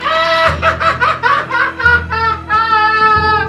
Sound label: laugh